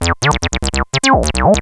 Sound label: square